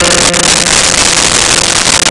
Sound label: Piano F3; Nintendo; July 2020 Gigaleak